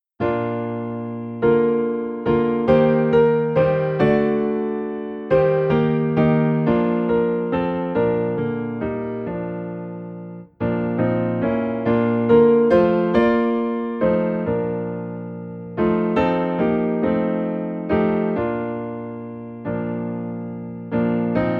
Piano in B Flat

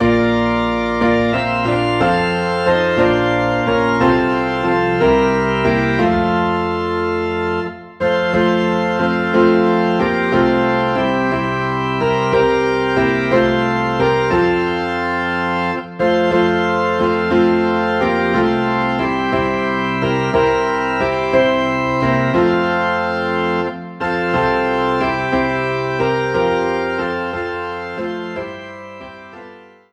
Piano and Organ in F

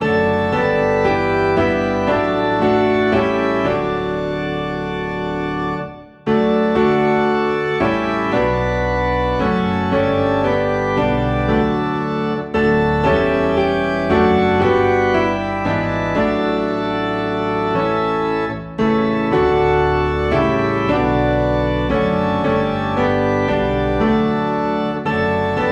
Piano and Organ Low in D

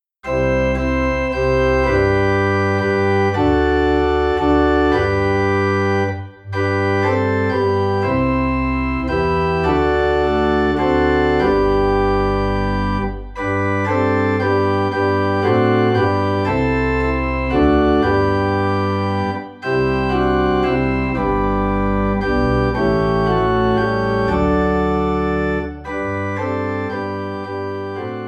Organ in G; Faith of Our Fathers

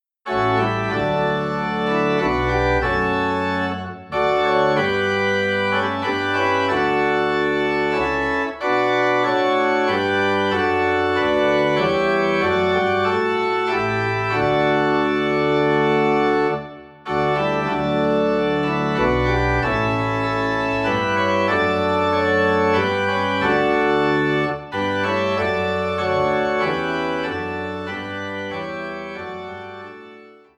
Organ in D; The First Noel; christmas

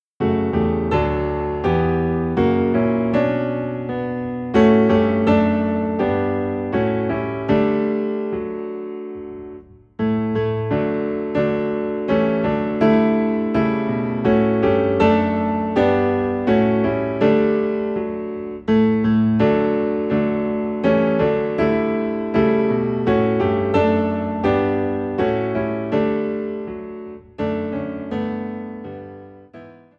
Piano Low in D

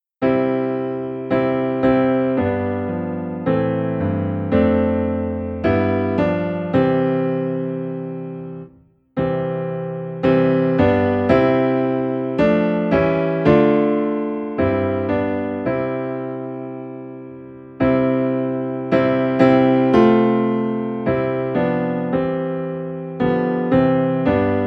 Piano Low in C; Played on a perfectly tuned piano by a church pianist in hymnal style.

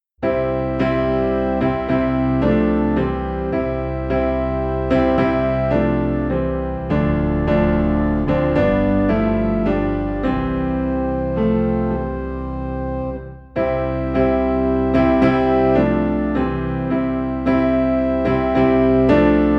Piano and Organ Low in C

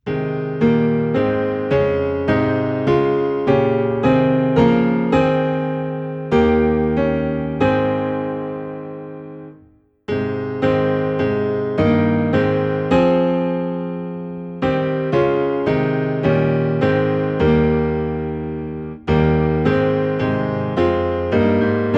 Piano Low in A Flat, hymns; Hi Db Lo Ab; Rejoice the Lord is King

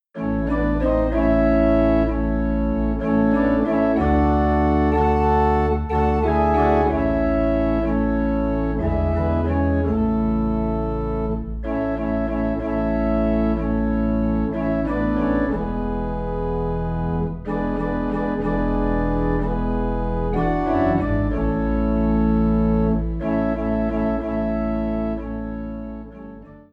Organ Low in A; Blessed Redeemer

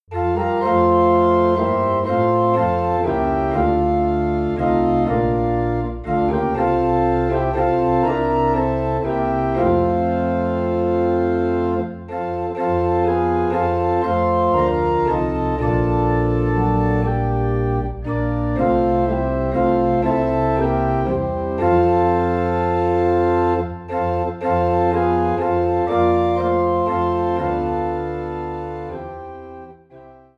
Organ in F; Turn Your Eyes Upoin Jesus

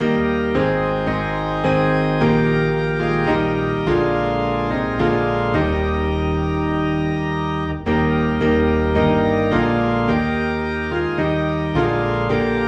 Piano and Organ Low in D Flat; Whiter Than Snow

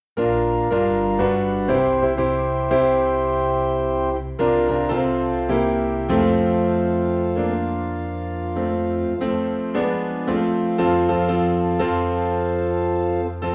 Piano and Organ in F

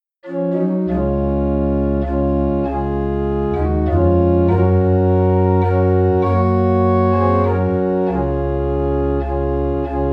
Organ in D Flat; Just As I Am

